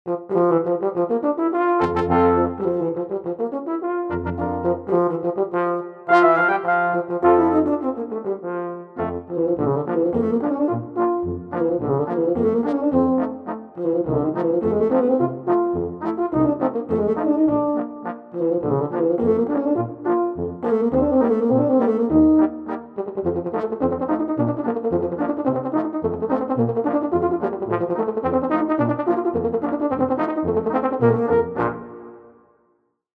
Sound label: Concert Polka